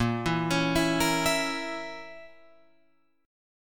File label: Bb Diminished Bb Guitar Open F; arpeggiated; JGuitar